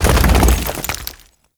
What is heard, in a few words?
Condemn Earth Crack; Warframe; Priest